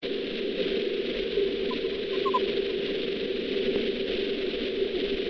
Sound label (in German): mopsfledermaus